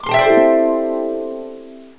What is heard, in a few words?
harp dwn